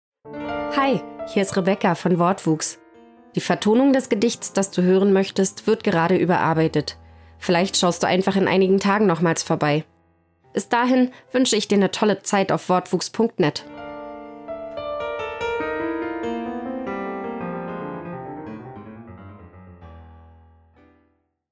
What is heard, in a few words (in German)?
vertonung der ballade